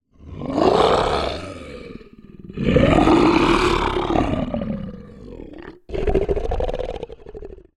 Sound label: tiger rawrrrr